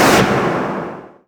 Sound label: last word damage; dota; silencer